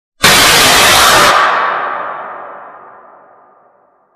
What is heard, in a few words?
jumpscare sound; five nights at vlongs